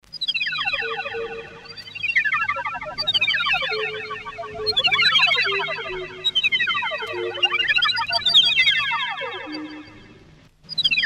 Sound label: ascend + descend seq, Electronic Sound Patterns; His Master's Voice